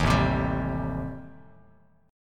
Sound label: A-Minor 7th Flat 5th, Bass Standard, chord; 11,0,10,12; Am7b5 strummed; JGuitar